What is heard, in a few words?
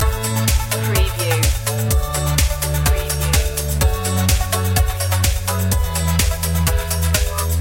life celebration loop